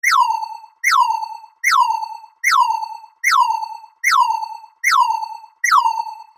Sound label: Science fiction cockpit alarm loopable